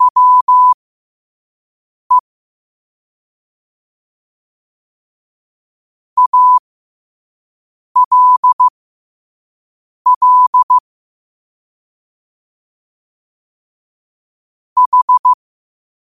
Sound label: 5 wpm, morse